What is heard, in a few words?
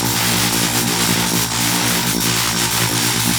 Ground Laser Loop; Enemies; Abilities; Warframe; Teralyst; Bigalyst